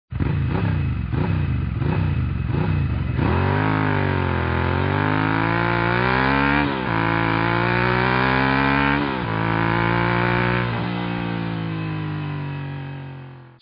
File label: Ducati 916